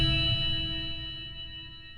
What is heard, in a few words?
sonar Tail Water Medium Beacon; Barotrauma; Real Sonar